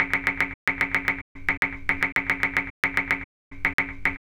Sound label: Hi-Hat Esoterica, Beats